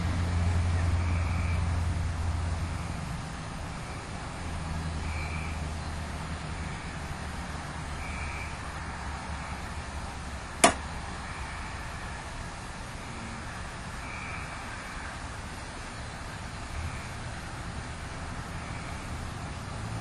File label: Ticket Booth Unexplained Loud; Case 022 Lake Shawnee Park; Olympus Plug; KNOCK